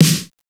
Fairlight CMI III Snare, Drum Machines

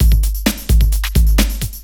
drum 130 electro, drumloops

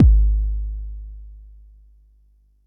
Bassdrum, Jomox Xbase09

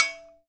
Kitchen Foley Dry Drums

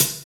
hat snare, Hi-Hats; Roland L-CDX-01; HH Modules

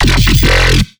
BASS SHOT F; Notmodern

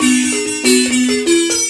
BZ XY GRV, BUZZ XYLO; Spectrasonics; Heart of Africa 2

